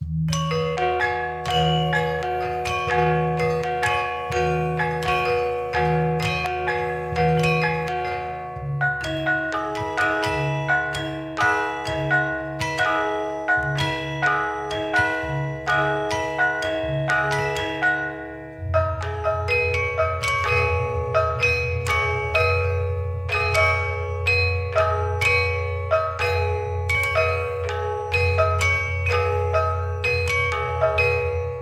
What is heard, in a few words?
carillon